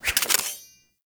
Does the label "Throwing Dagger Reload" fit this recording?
Yes